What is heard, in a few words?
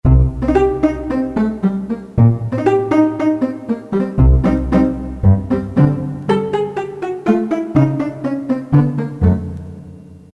Pizzicato, Roland MT-32